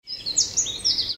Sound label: birds